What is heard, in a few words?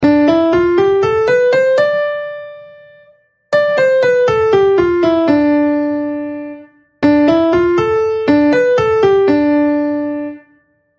dorian minor